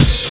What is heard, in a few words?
OP HAT; East West - Phat & Phunky